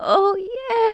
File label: hunter talkie box